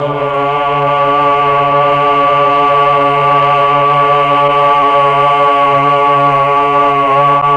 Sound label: MELLOTRON vox D2; Big Fish Audio - First Call Vintage Keyboards